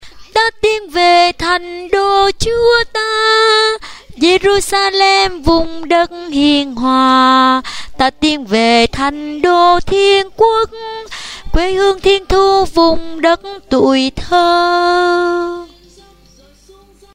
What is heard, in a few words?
sop alto